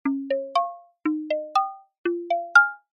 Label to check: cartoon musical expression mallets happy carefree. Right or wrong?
Right